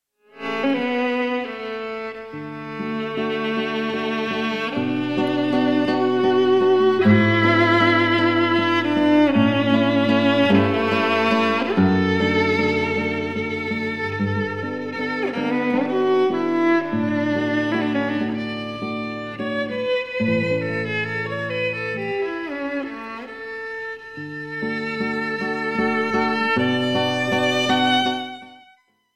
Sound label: cantabile in d major for violin and guitar